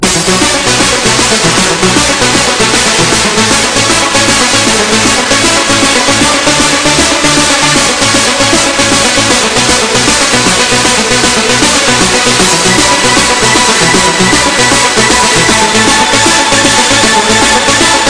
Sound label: hardstyle